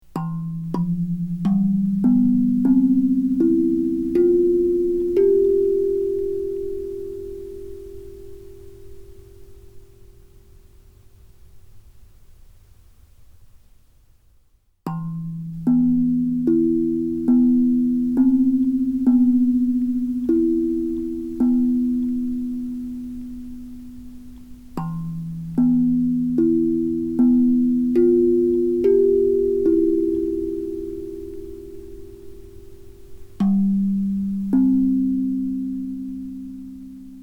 Hapi Scale E Melog

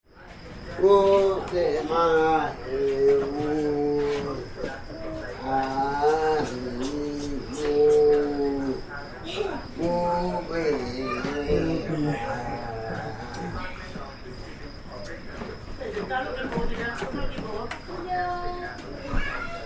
teahouse lullaby, nepal

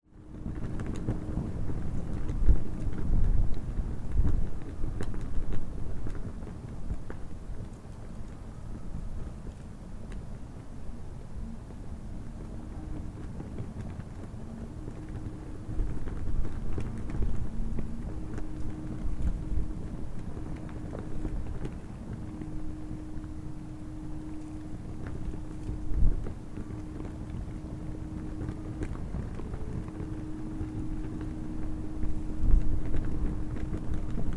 powerpole resonance

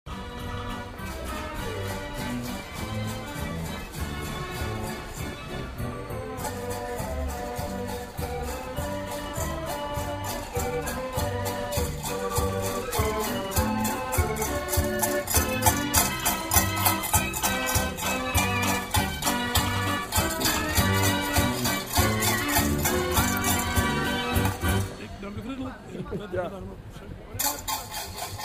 Amsterdam Kalverstraat Organ

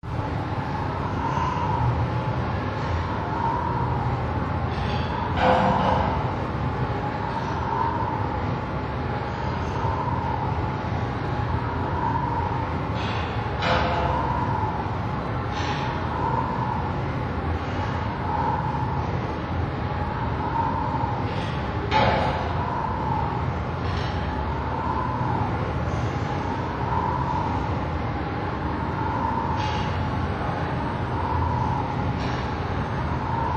Winter Hillside; Hamosand

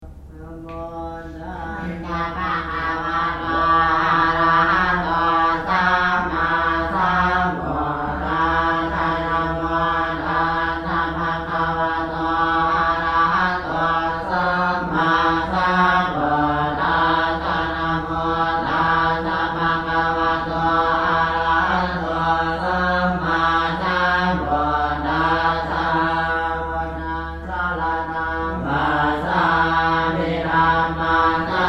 Xieng Thong chant, laos; Xieng / Thong vespers; The / jeweled golden sunset at Wat Xieng Thong, the most ornate Buddhist / complex in Luang Prabang

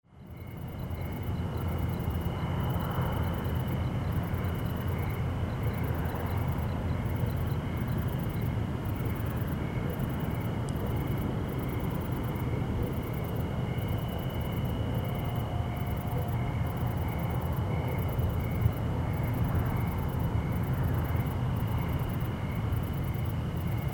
Pinyon Hills NM Desert Night

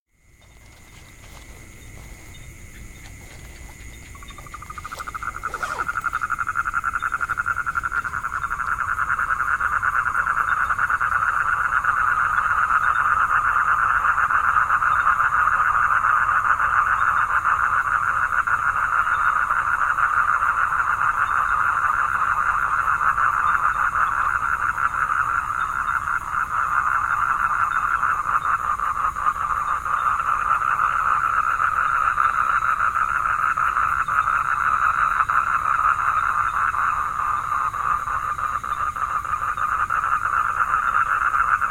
Frogs, vietnam

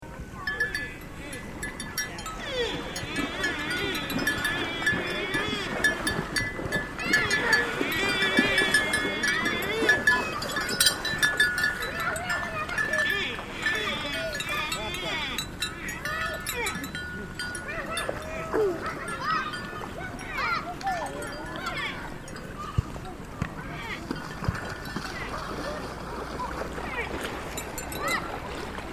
buffalo bath

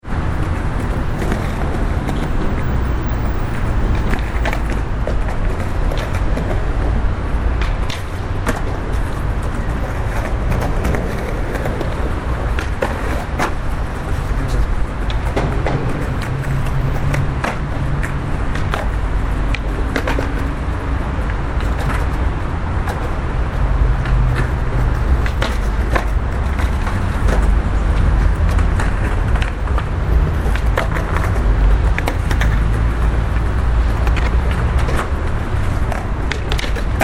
Skaters

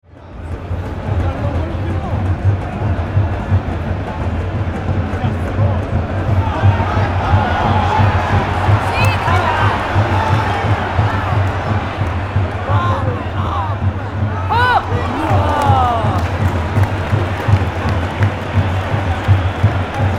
Milan San Siro Stadium

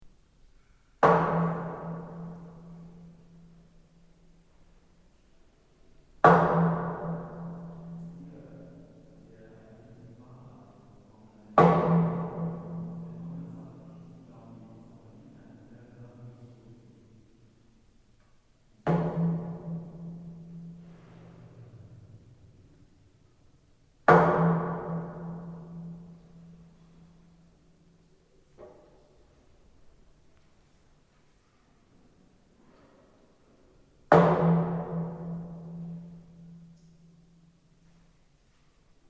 stalagtite, Burma

